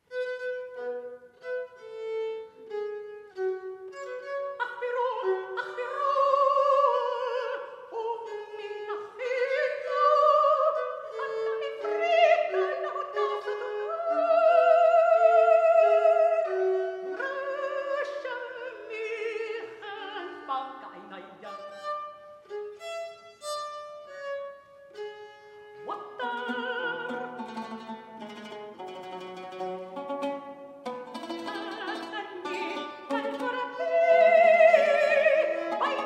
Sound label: Eyelids, version for mezzo, oud and vihuel; sung in Arabic